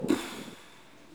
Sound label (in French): pfff soulagement